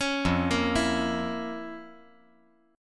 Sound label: F Major 7th Suspended 4th Sharp 5th F